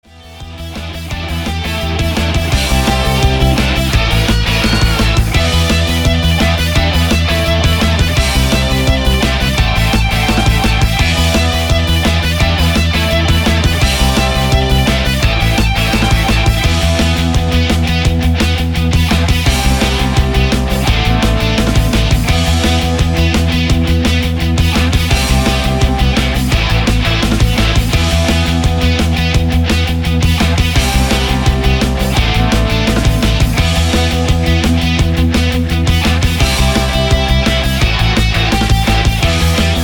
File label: modern rock